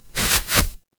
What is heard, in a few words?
paper fabric fabric